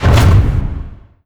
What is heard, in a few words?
match close, ui menu